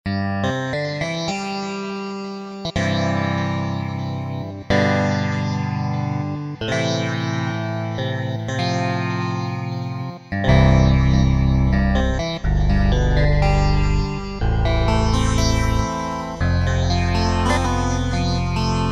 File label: Guitar mode